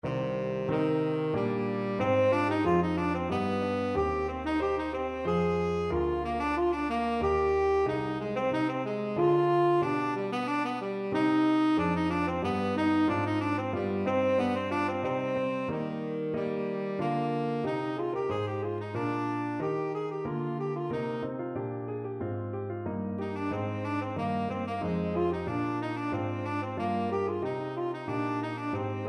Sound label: tenor saxophone